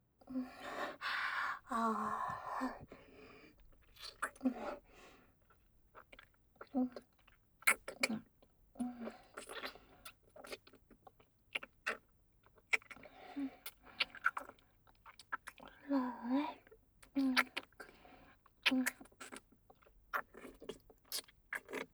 汗だくおっぱいパイズリフェラループトラック

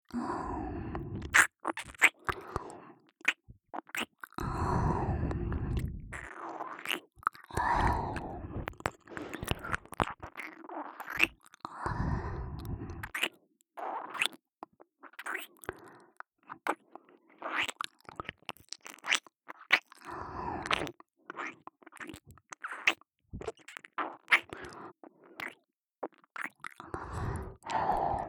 耳舐め L (左耳); 新人

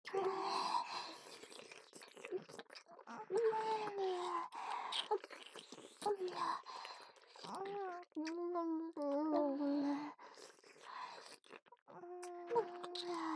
Wフェラご奉仕; おまけループ（オナサポ）; フェラプレイ

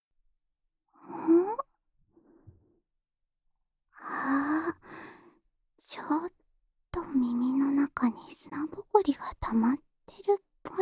安眠用お耳ふーふー（右耳）

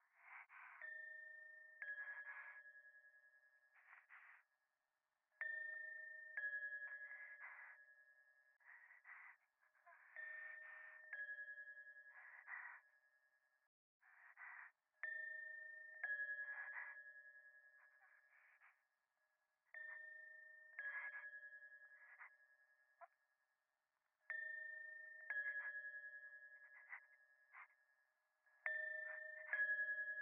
左耳 密着 吐息 神社

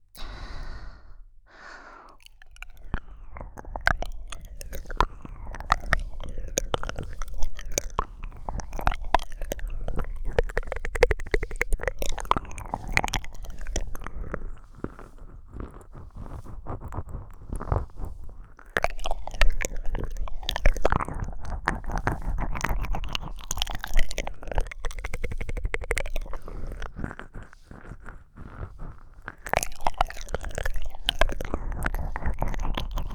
頭なでなで

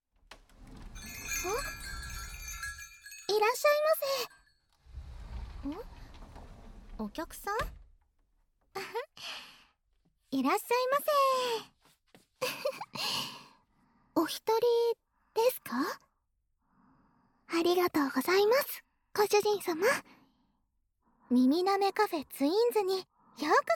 耳舐め喫茶へようこそ

